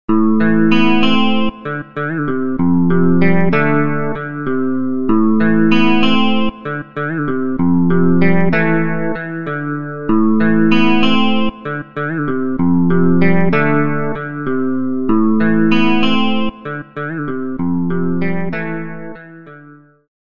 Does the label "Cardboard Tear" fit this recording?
No